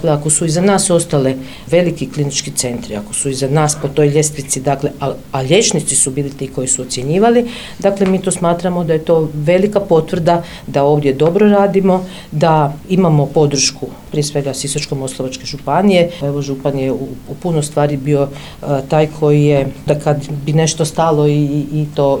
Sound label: POP